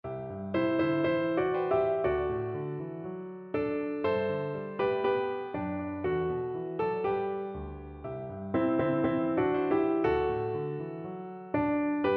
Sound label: both parts